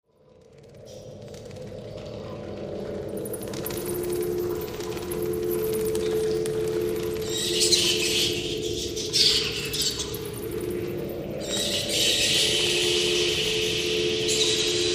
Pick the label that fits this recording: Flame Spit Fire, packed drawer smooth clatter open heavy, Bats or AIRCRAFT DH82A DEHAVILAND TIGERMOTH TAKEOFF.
Bats